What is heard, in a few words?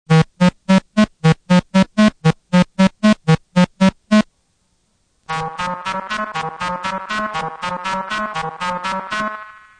Reverb